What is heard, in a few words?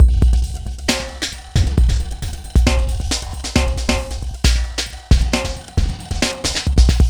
Bpm135 Break, Dubstep, Drum Loops